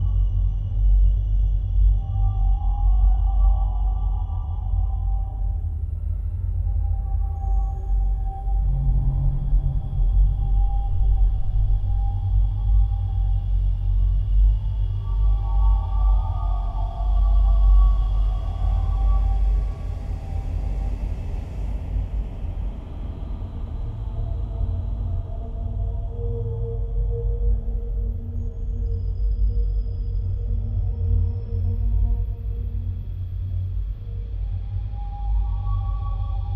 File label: monolith idle, anomaly; Desmans Horror Overhaul